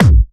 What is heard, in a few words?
original kickdrum C key, kickdrum sample tuned to the C note